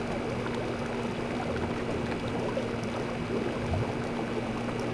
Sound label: sewer flowing ambience; Star Wars Obi Wan; XBOX